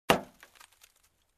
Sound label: Bursting Glass